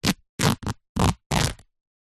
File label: clothes rip cotton short; zapsplat; foley